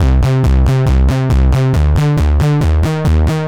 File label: Bass 138 C; Audacity; loops